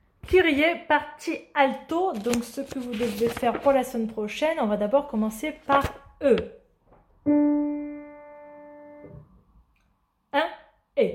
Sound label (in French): partie alto; Kyrie